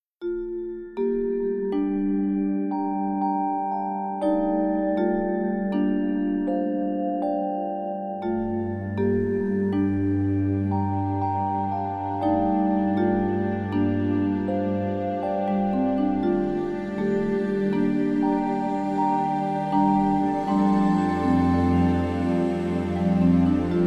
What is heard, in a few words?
Drama reflective tender chimes